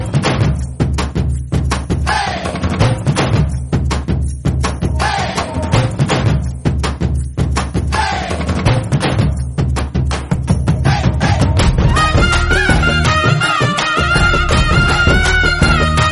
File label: BGM Instrumental